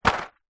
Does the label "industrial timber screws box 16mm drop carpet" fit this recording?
Yes